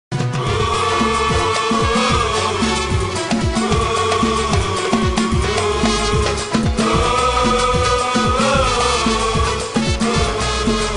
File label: Audience